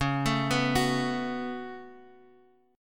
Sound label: C sharp 7th Suspended 4th Sharp 5th, Guitar Drop D